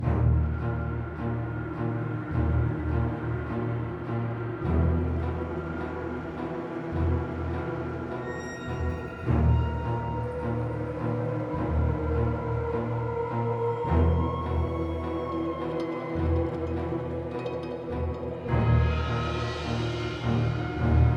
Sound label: Lobby Loop T52 4-4; Mistover